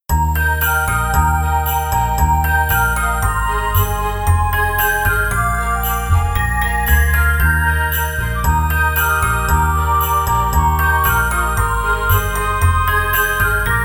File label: Jingle Bells